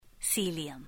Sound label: Pound, Key tone; i-mobile PANO DC5210; Thai